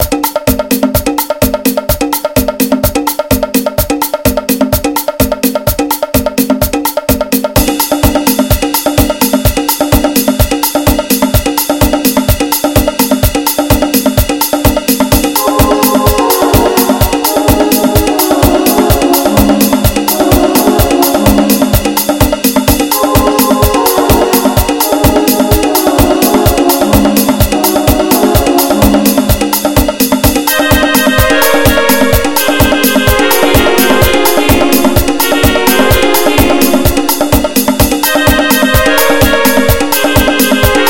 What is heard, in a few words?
Trance Remix; FL Studio